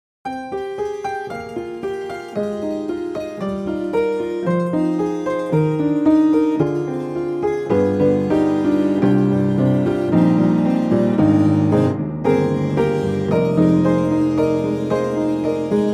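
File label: Upright Tremolo, piano; Spectrasonics Keyscape; Wing Upright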